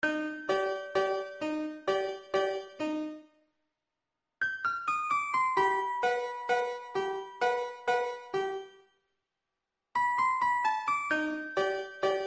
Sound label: Midi dialtone music